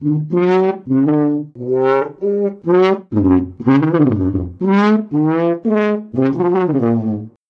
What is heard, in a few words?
Mission Failed; snoopy vs the red baron